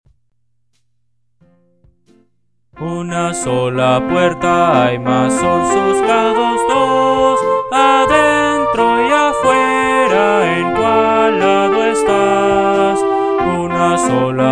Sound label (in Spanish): una sola puerta hay, Himnos Cristianos